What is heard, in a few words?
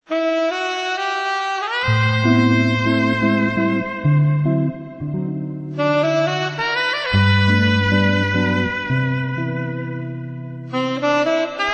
SAX